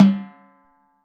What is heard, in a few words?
Timbale High Open